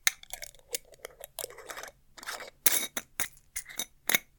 small bottle close

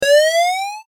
jump

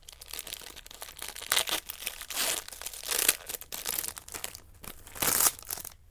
opening a crisps bag

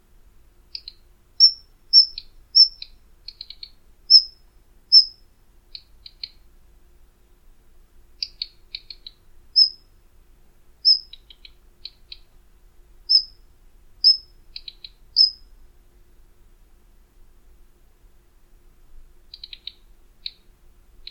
flycatcher

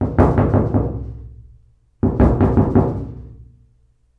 knock on washer; knocking

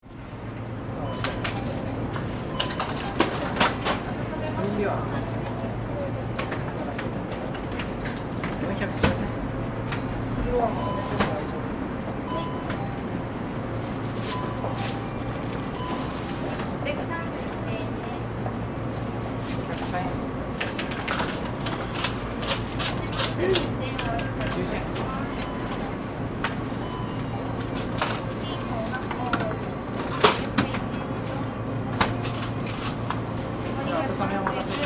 convenience store

